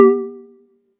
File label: boo